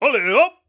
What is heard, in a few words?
launch balls